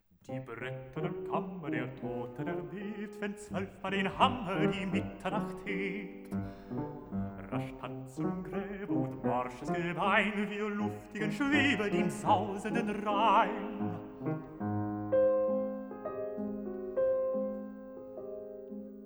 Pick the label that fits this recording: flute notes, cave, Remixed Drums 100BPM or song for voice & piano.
song for voice & piano